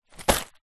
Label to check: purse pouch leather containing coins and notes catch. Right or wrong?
Right